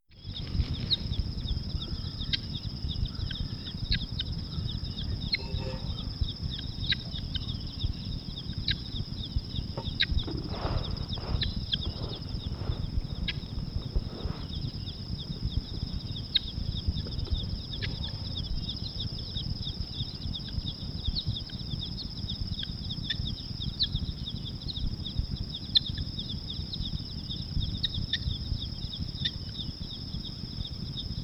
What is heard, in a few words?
Numida meleagris Helmeted Guineafow